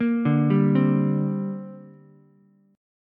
D 7th Suspended 4th Sharp 5th down Ukulele; D7sus4#5 arpeggiated

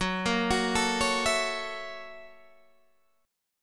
Gb 7th Sharp 9th Gb Guitar Open G

